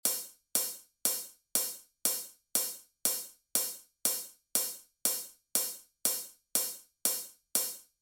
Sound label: open hi hat